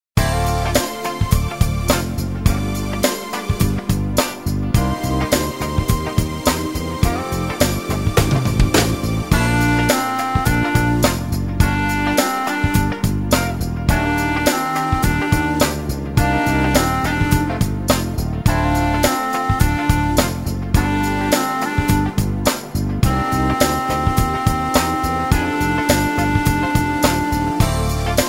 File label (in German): klarinettentaxi